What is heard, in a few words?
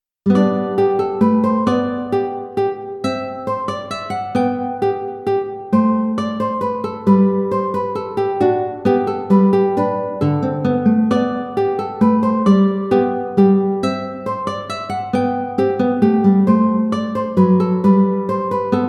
Menuet Guitar Keyboard; BWV 114